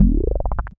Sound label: Swoop Bass, Drum N Bass; Sonic Foundry (Sony Creative Software); Knutrix Drum & Bass